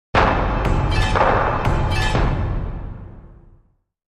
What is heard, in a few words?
Hits